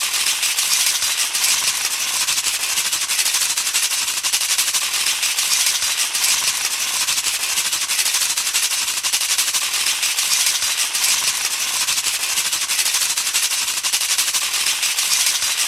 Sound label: Antique Tambourine 110 BPM fast; Перкуссии (Percussion Loops)